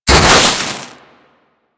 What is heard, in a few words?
Heat Gun Hit Effect; Grineer